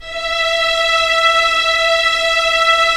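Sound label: Strings Violins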